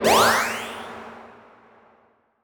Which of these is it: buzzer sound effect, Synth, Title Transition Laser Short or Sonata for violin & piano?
Title Transition Laser Short